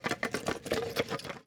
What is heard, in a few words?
Bone Pile Movement